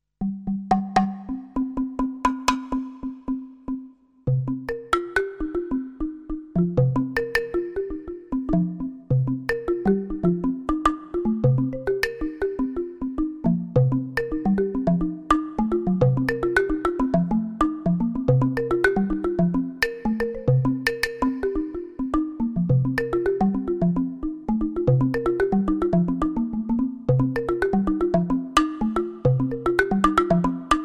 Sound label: balafon; senegal